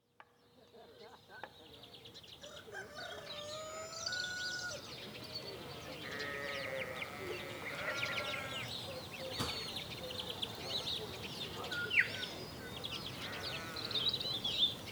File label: Ambience; PrinceOfGlory; OracleStudio